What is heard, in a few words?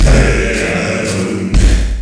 drum